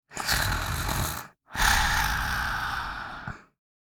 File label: Deep snoring girl child kid; gfxsounds